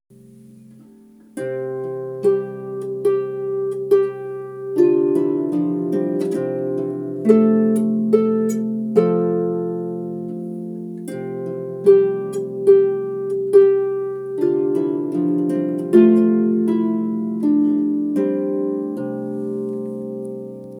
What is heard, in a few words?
Lavenders Blue Both Hands, harp